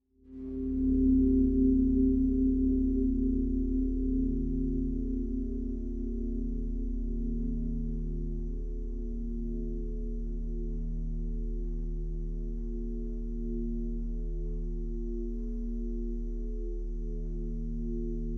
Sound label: cymbal soundscape